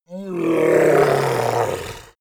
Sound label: yeti growl struggle